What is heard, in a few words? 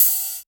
OP HAT